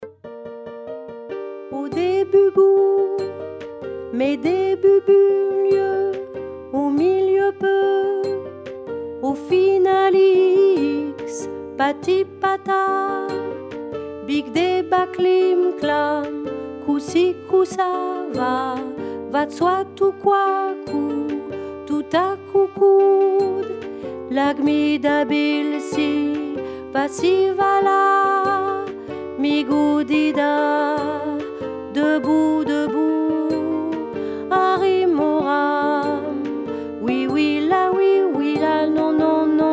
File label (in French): ALTO TENOR